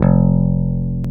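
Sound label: Bass Electric; CMI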